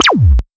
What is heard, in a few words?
normal hit normal